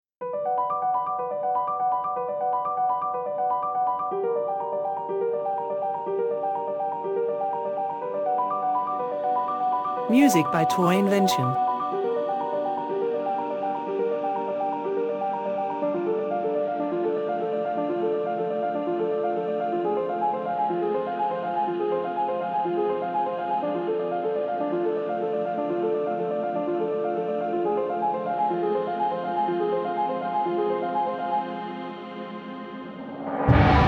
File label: Dark Cinematic Trailer